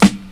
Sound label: 00s short hip hop snare drum g key; snare drum samples